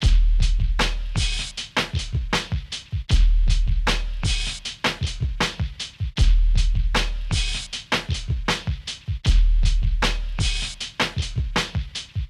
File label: 78 bpm, Loops, breaks